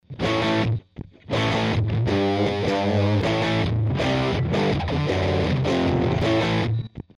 Power Chords

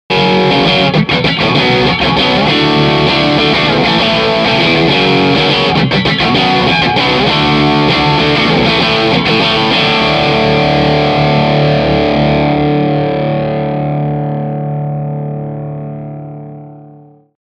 Bridge pup Crunch with Bass Cut

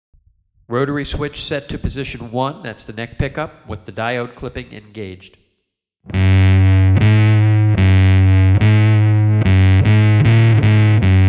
EB POS1 DIODES

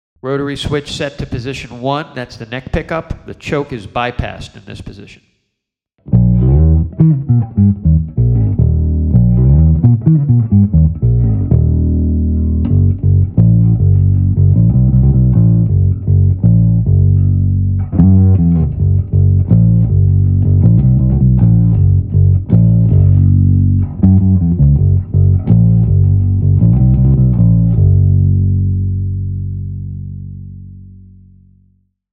EB POS1 NECK

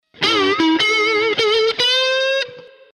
BLUES LIK

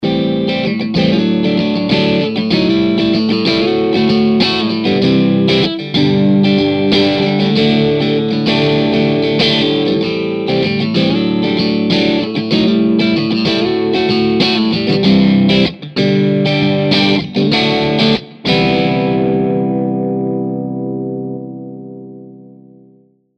Jangly Parallel

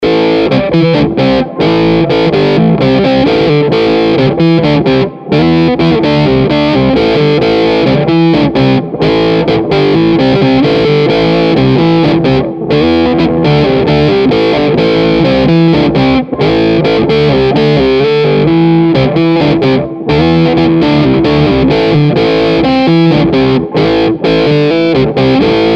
Bridge pup Bass Cut PLUS Treb Cut

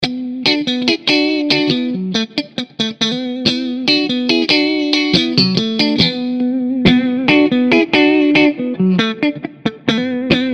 Neck Mid Pup Funk SERIES VS PAR

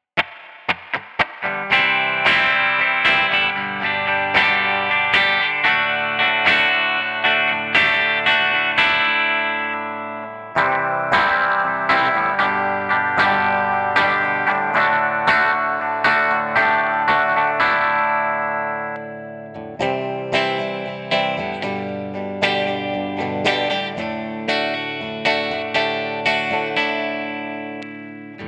varitone clean

